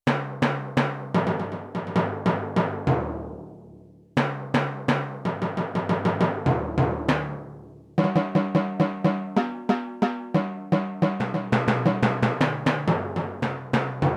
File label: Timpani & Gong, Yamaha TX16W